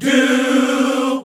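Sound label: Gospel; Best Service Gigapack 1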